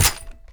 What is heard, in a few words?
smg empty; svencoop; rngremake